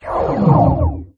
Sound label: absorb; john carter; lost symbol